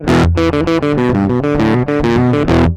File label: Metal Motif; IDMT